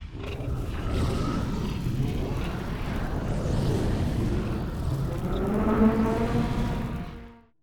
manticore idle, monster

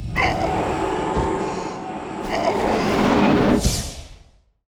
death, monster; elimental; lake

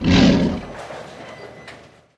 mech ape damage, monster

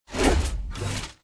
damage

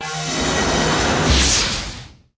forcetower spawn, monster

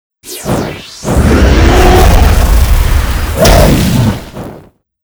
dead, monster; patren

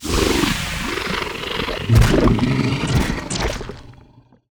seedfrog death, monster

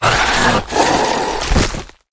giant spider dead, monster

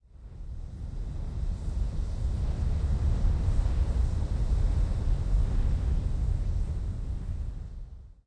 swamp, lake

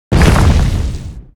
skill explo

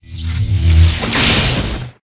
lightning bead atk

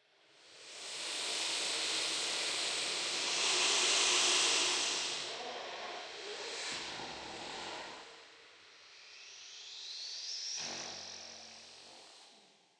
barren, lake

